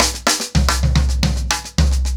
110BPM, SNARE TOM SOLOS

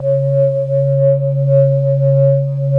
PAD, Synth Pads; SYN-PADs; Synth-Pads 4

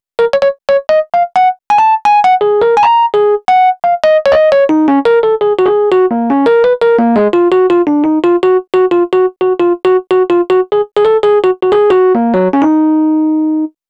Rhodes Solo, Cinematic Funk; Cinematic Funk Urban Tone Poems

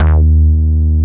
HOLLOW BASS; Masterbits; Dance Trax

